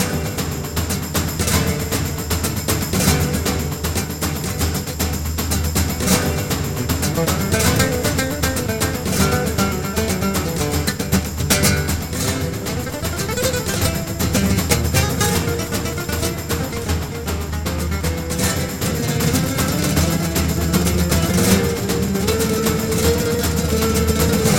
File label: canyon music loop; The Adventures of Puss In Boots - Barrel Run; NextlevelGames